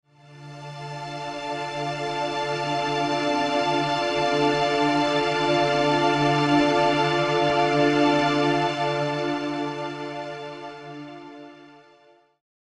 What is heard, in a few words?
Synth Pad